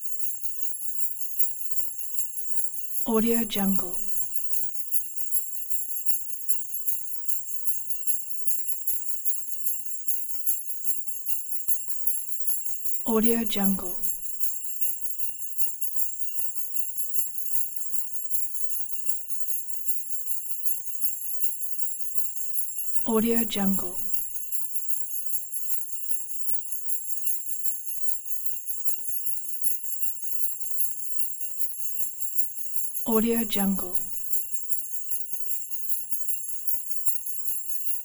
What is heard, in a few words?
christmas loop sleigh jingle bell fast slowed light airy; accent